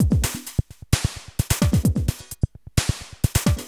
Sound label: Beat, Industrial